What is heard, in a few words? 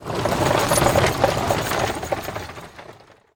Carriage